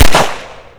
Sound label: gun; weapons